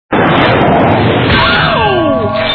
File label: oow; courage